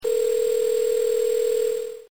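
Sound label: ring phone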